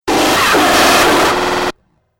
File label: Droid Rust Bucket, robot sound effects